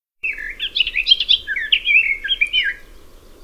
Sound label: garden warbler